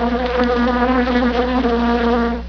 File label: spell bee